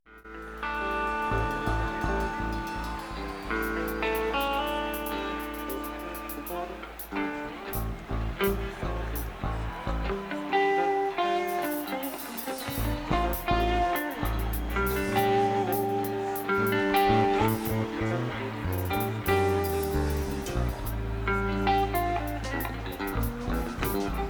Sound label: Tuning